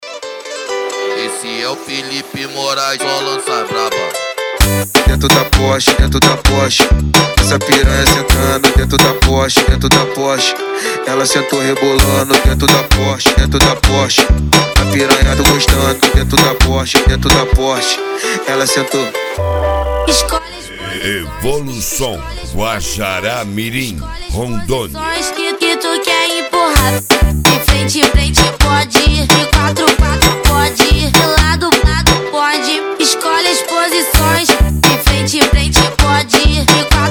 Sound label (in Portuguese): noia dance